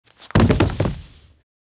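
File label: body falls onto wood; Parkour; Butterfinger Video Sound Effects; Additional Parkour Sounds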